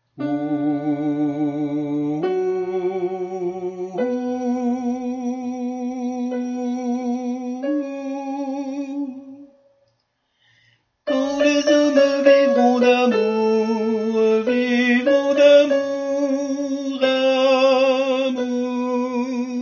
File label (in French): tenor